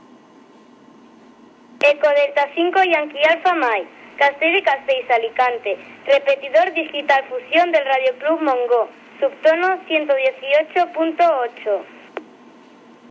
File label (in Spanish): baliza digital vhf; ED5YAM